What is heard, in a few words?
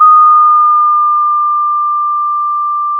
pop, Sound of a school bell